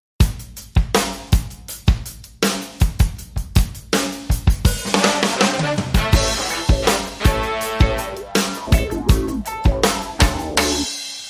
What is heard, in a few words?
seventies funk